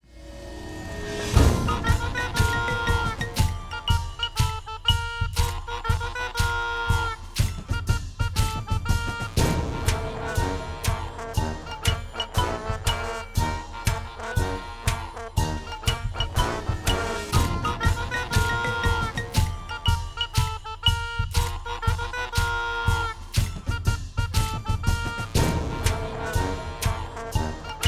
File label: Loop; Baby Wendigo Attacked Pre Lightpuzzle